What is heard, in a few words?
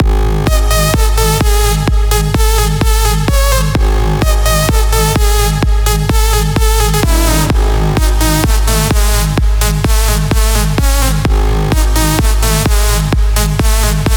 128 BPM Big Line Kit MIX, Root G; Vengeance - Essential Clubsounds Vol.5; Demo Constr Kits